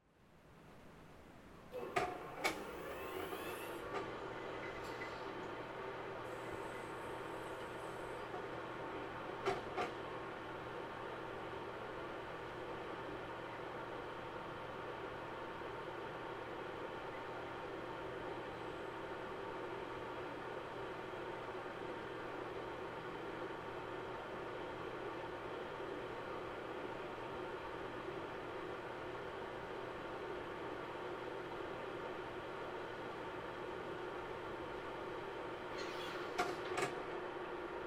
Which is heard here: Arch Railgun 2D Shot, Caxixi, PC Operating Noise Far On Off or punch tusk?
PC Operating Noise Far On Off